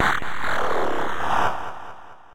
phone message

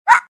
bork; vicksy

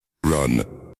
run; metal sonic

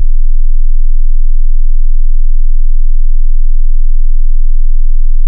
bass test

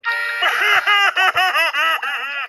fish crying

